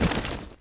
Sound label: jump landing